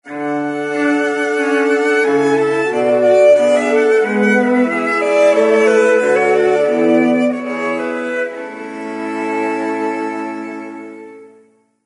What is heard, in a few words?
Bach, Nokia Ringtones; Nokia 6080; Southeast Asia